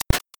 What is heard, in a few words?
arrow shot; ludumdare; ld31